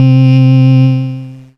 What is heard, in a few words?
Yamaha PSS-140 Small Church C3